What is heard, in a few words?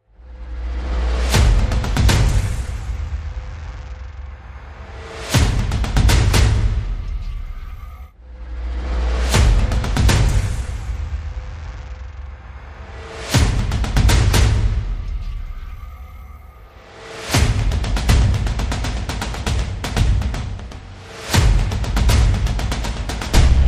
Percussion and FX